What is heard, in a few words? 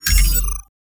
ui target